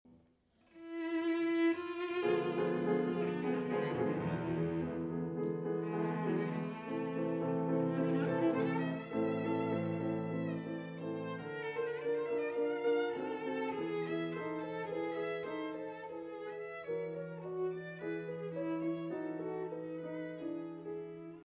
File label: arpeggione